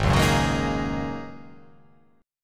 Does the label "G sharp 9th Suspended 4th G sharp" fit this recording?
Yes